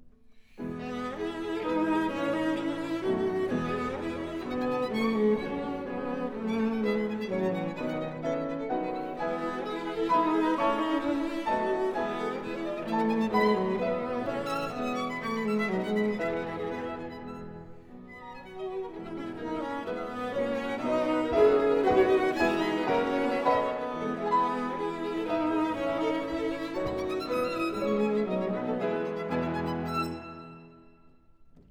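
Trio for Piano, Violin and Cello in A minor Più mosso; Deutsche Grammophon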